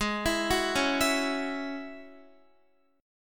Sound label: Ab 7th Suspended 4th Sharp 5th Ab down Guitar; Ab7sus4#5 arpeggiated